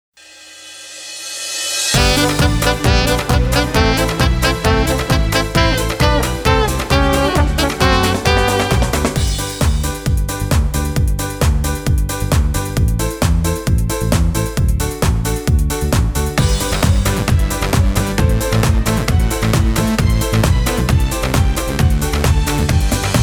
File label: Dance folk; Bodaj by vas vy mladenci; ludove piesne